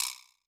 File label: Vibr Slap, Yamaha DTXpress-II, Perc